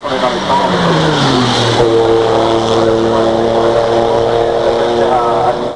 porsche

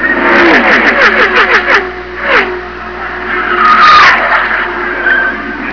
backward

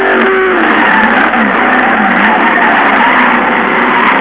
spin out

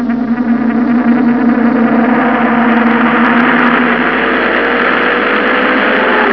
angry bee

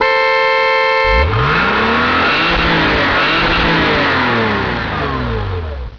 boxster

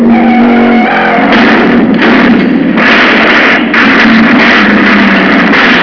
bumper

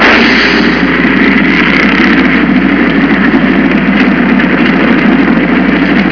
flat run

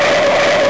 brake

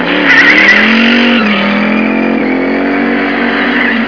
tire spin

